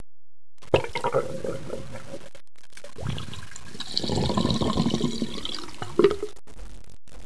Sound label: drain